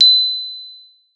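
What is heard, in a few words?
GLOP B4, glockenspiel; Xsample VOL-4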